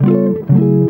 EGUIT CHORDS; Best Service; World Colours

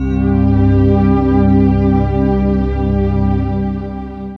C, Pads; Zero-G; Total Drum Bass

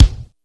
kit, Yamaha Motif-ES8

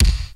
EMU BD, DRUMS; Crypton - DrumBase5000; CD DRUMS #1

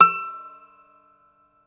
Irish Bazouki

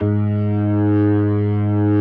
G#2S, B3, Vintage Keyboards

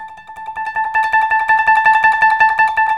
KOTO TREM

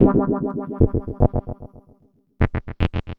Bass, Futurist Drum & Bass

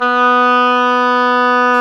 oboe, Roland Orchestral Winds

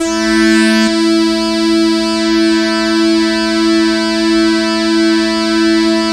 YAMAHA CS80 PWM, Vintage Keyboards; Big Fish Audio - First Call Vintage Keyboards

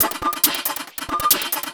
percussion loops, Techno; Best Service ProSamples vol.45 - Techno ID; PS-45

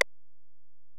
EPerc, Alesis DM-PRO

